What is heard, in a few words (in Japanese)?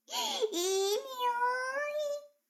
シコシコ音声; 逆時間停止されて